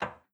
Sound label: Button General; netent